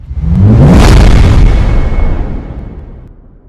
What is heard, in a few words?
explosion; grimm